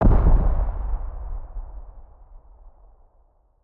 c4 explosion distant; Rust

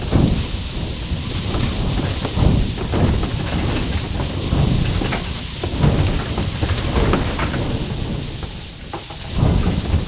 airstall loop, engines; War Thunder

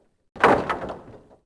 Oars Wood; Civ4

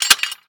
gate open; Doors; Call of Duty Modern Warfare

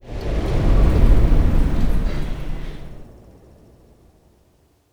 blackout power down

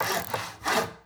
hammer saw; Rust

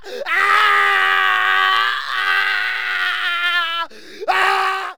beartrap scream; Rust

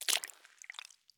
walk puddle; Escape from Tarkov

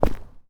Personnel Footsteps Concrete; DCS

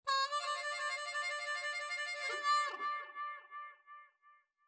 music interactive; World of Tanks

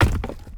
furnace deploy; Rust

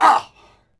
hit vox; Civ4 warlords

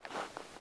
Leather Creak Short; Civ4 beyond sword

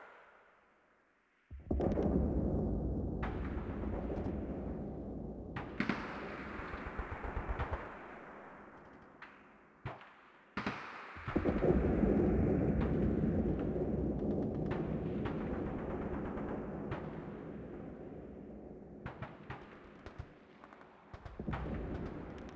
combat atmosphere background; World of Tanks Blitz; ambient neptune

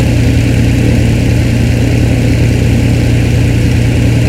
stuka rear, engines; War Thunder

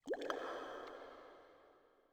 water drip; Rust

